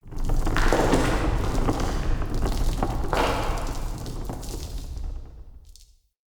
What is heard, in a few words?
Cave Mine Rumble